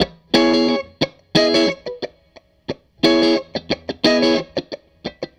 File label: Strat Guitar Loop